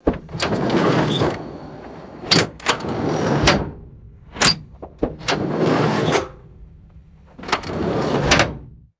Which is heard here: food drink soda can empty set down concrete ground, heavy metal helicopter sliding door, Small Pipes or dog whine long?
heavy metal helicopter sliding door